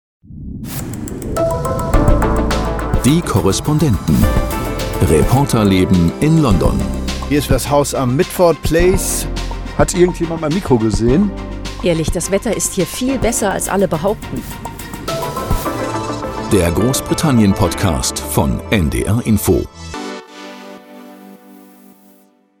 Podcast Opener; NDR Info; London

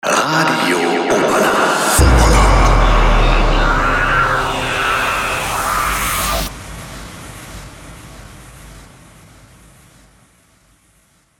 Universal Transition; RADIO OBERLAND

